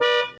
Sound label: honk